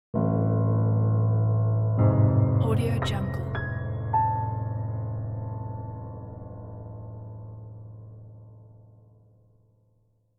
mysterious piano

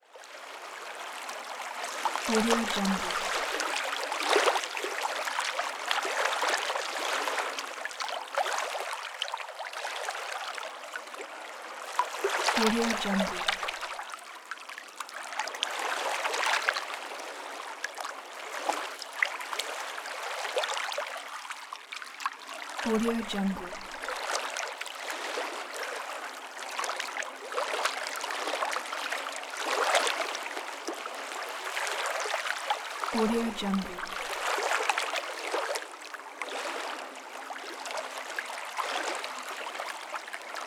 calm waves

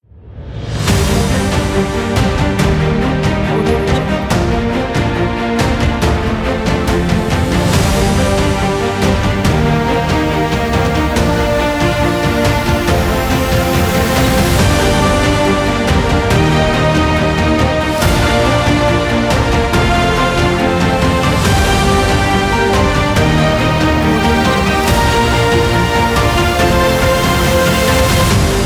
for epic ident

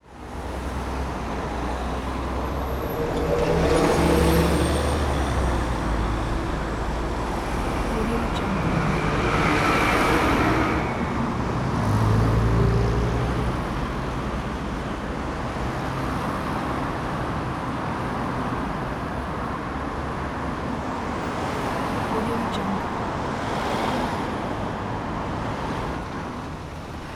traffic

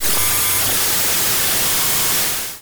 electricity interference; soundmorph

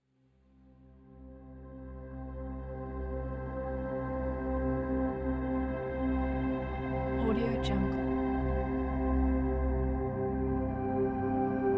theta binaural waves

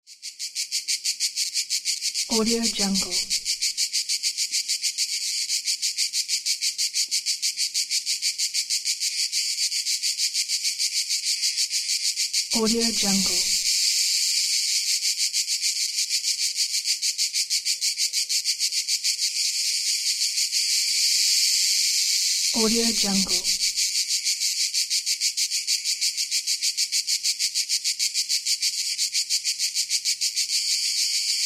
cicadas on summer

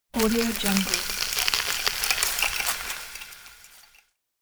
thick rustling spilling

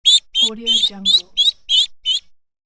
duckling

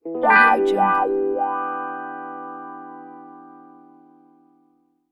wahwah guitar

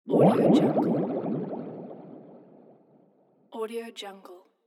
bubbles

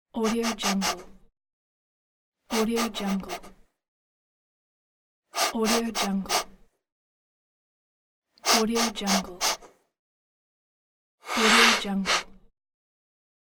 sniff

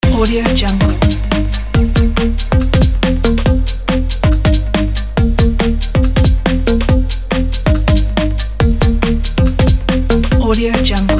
background media electronic loop

